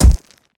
Heavy Rock Impact

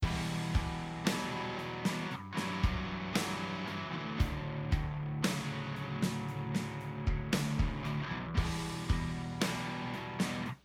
Butt Rock; Dream Daddy: A Dad Dating Simulator

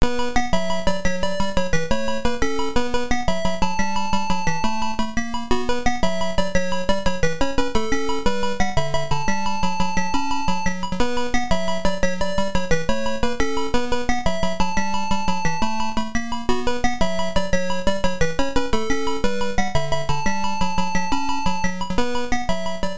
All Clear; Gradius; Sharp X1

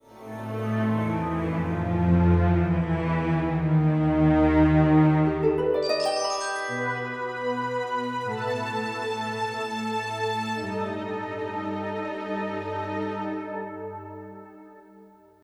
Suspense Music

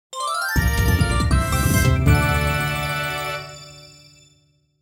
Snowboarding Goal

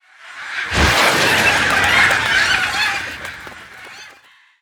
bird Attack; angry birds rio; Supubird Attack

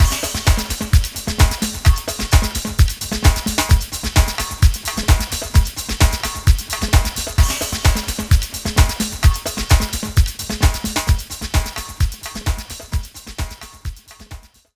Beat Only; rayman arena; Lagoon

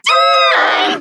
Hit

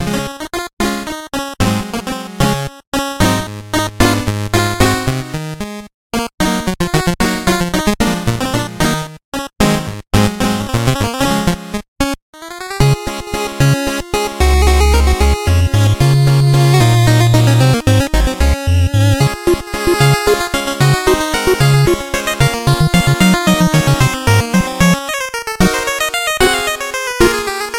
Music Moms House; Shovel Knight King of Cards

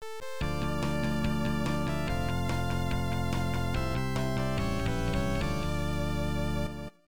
(electone); Great Sluggers 94 New World Stadium; namco nb 1; arcade

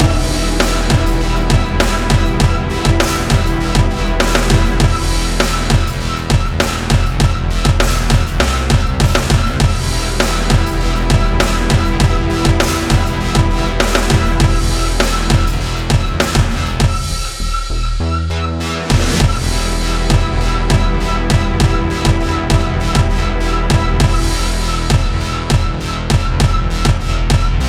Fight Loop; evacuation; windows gamerip 2023; Camp

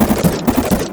White Worm Show Hide; A Robot Named Fight

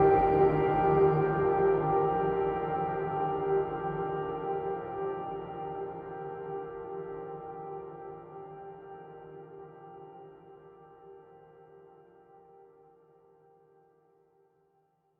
Piano Fadeout

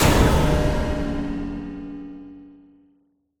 Cinematic Hit; killer is dead

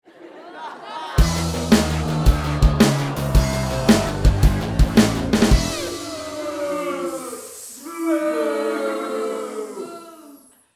Failure; Worms 2

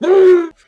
Mascot windup mad

